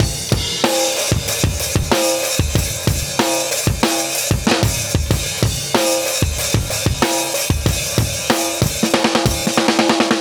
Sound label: 94 Triplet Trick, Live Breaks